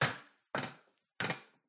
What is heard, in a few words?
household foldable metal stepladders footsteps hard bottom trainers climb